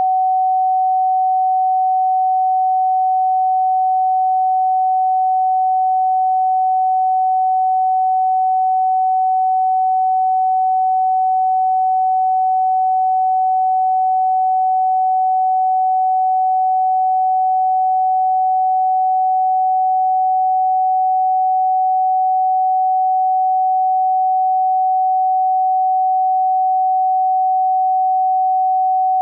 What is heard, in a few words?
750hz sine; new sine tests